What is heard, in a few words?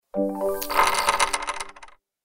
chips to winner notification; poker game